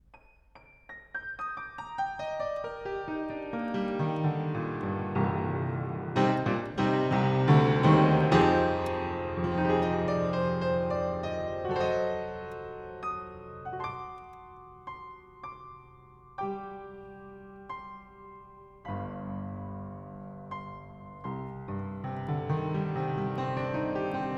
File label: upright panels off 1m pair